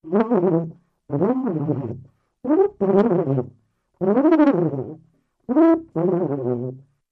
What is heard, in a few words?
Talking Tuba